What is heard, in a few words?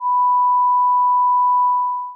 tinnitus